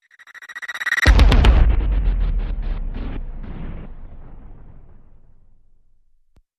Hit; Sttr Massacre

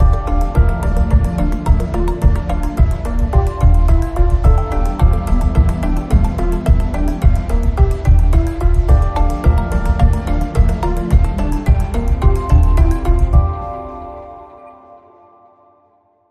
Bumper

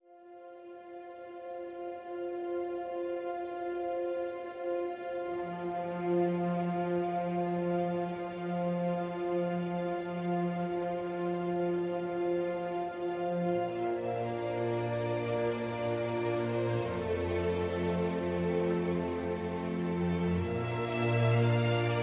Strings and Pads Only

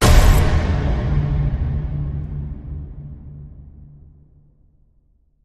Hit Tonal Origin

